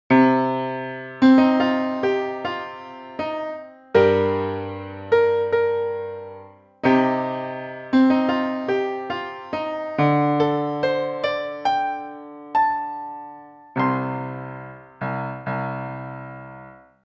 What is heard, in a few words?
Essenfelder Piano